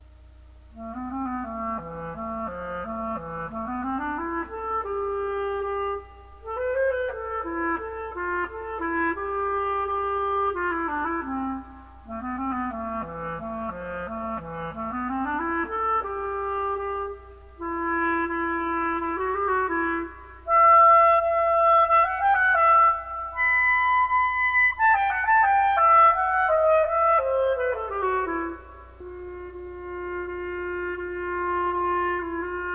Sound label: Oriental Music, Solo Clarinet; Moving Picture Music for Solo Clarinet; John Stephan Zamecnik